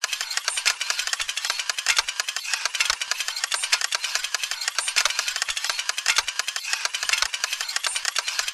science fiction steampunk mechanism metal small clicks mechanical operate; zapsplat